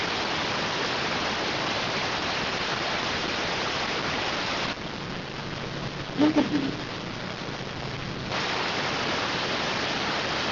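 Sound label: radioteletype receive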